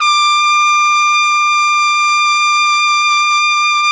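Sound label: trumpet; Roland - LA Composer vol. 3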